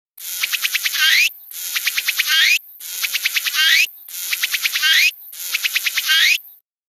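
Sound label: semi tuku, cicada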